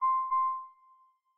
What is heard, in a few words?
Charging Start; Fujitsu F-02J